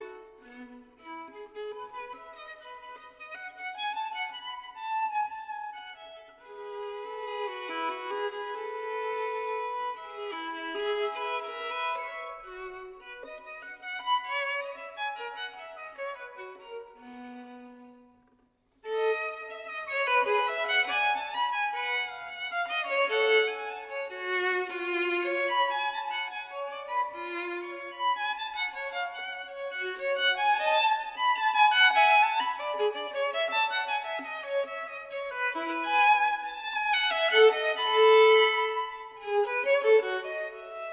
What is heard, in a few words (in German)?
partita iii e dur bwv 1006 menuet ii